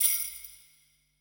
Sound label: E SHELL, ETH PERC